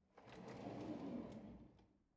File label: drawer cutlery draw in slow